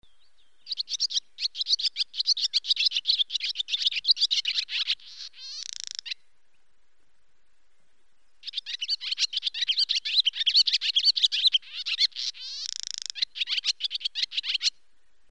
füstifecske, madárhang

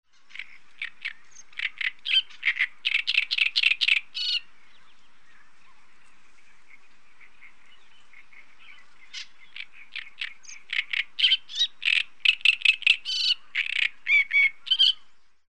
nadirigo

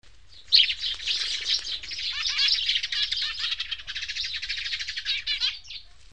mezei vereb

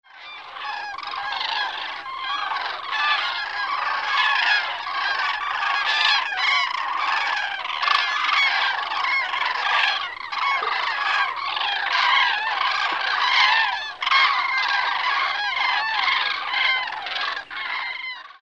daru, madarhang

